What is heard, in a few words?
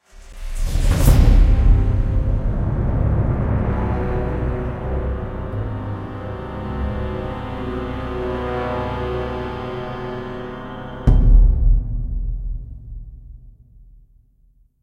Tense Sting; Jurassic Park The Game